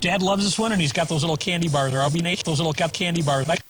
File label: noises